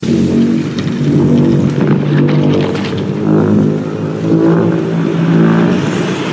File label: thermonuclear sounds; godzilla